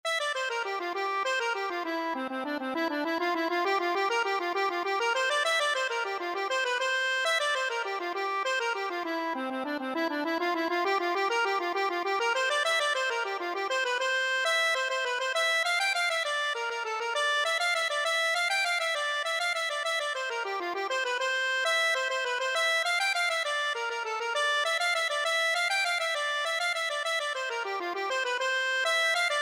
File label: accordion